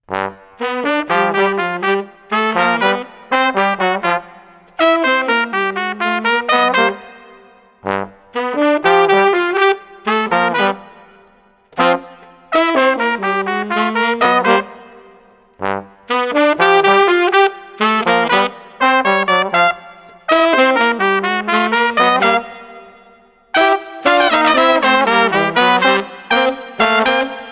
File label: Street Brass Ensemble Jovial 120